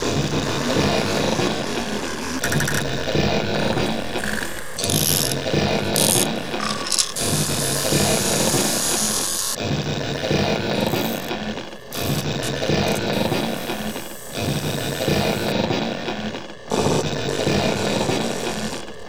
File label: Difference Engine, Machinery; Sonic Foundry (Sony Creative Software); Myths of Technology